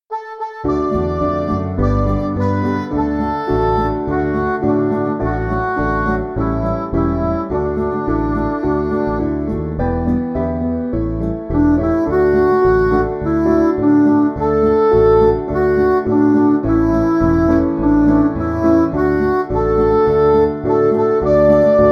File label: What A Wonderful Saviour, Midi; Hoffman